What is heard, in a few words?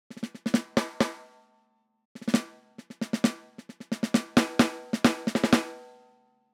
Snare